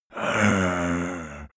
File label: zombie moan idle